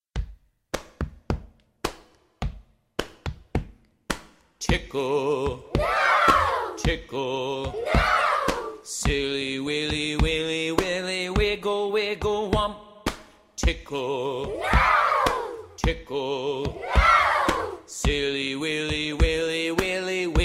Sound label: Tickle Song